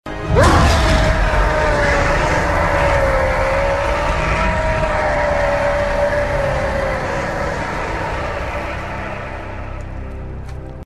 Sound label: roar